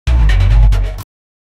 slice transition, design

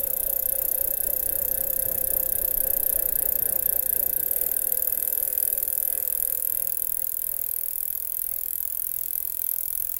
bike chain gear shift up; bicycle

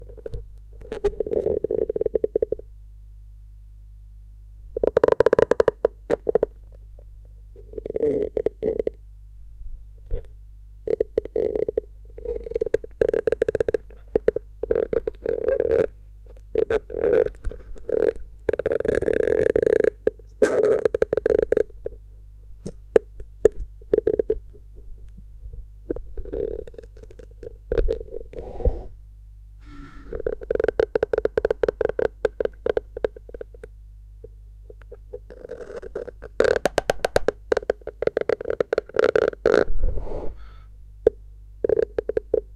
an unpleasant creaking so; danger

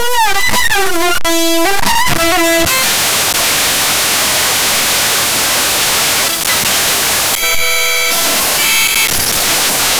sound effect of, social media

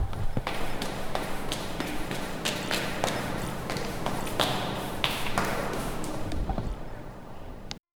an astronaut walking down, space suit

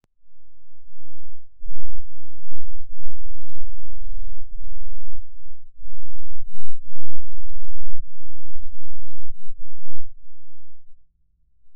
gentle whirring of futur, drones

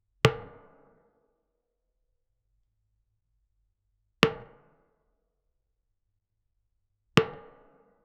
small metal ball hitting

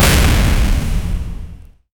comeback sound type, power up

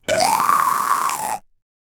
zombie hit reaction

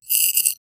a short crisp sound like, cute

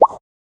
sound of an object appear, bubble

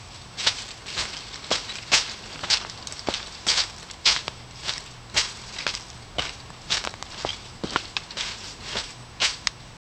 faint footsteps crushing, armor

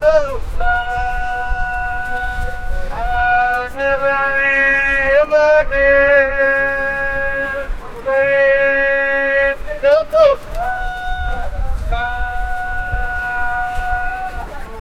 old arab market, bustling

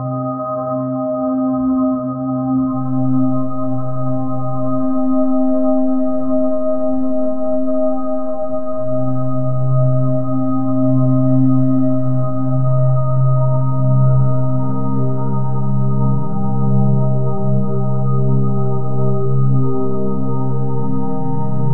alpha styl, minimalist

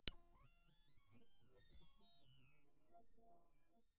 sudden high frequency sting

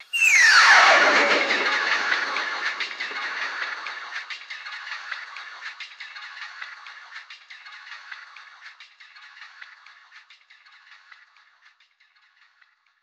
ak74 sound effects, automatic fire

